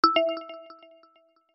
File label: Jump, notifications; Huawei HarmonyOS 2.0